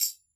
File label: Tamb; EdgeSounds; Drum Mashines VOL-1